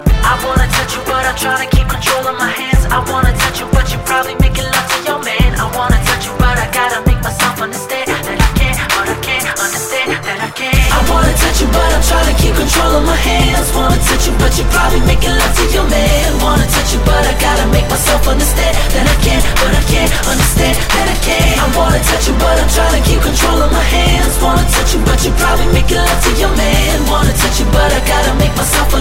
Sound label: Is Calling Inspirational